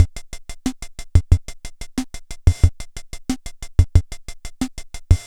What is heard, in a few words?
Yamaha PS-300 Samba